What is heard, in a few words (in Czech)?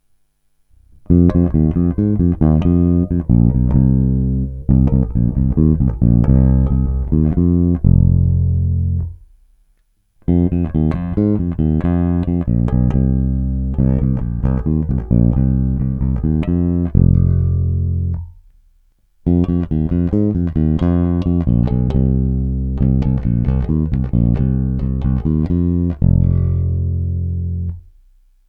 Snímač u krku aktivně, Baskytara; Aleš Vychodil AVBS5